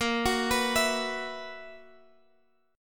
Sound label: B Major 7th Suspended 4th A sharp